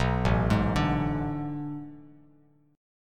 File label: A Major 7th Suspended 2nd Suspended 4th A down Bass Standard; AM7sus2sus4 arpeggiated; JGuitar